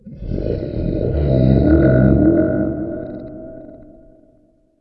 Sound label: moan; ambient; outdoor